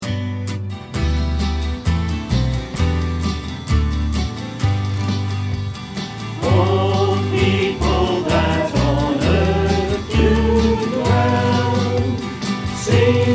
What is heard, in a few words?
choir; virtual choir